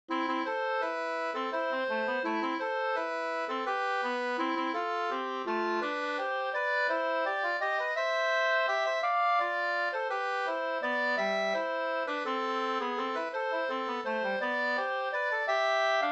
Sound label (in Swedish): vals A moll